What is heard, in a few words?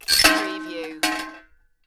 Metal Junk With Chain Clanks Rattle